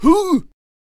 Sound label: Chieftain Attack Vocals